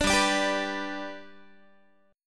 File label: G Major G down Guitar 4A 4E 5A 5D 5G sharp 6C sharp; strummed